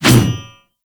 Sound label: Mace Whoosh; Warframe